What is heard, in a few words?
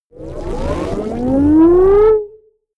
wobble glitch riser short, sciene fiction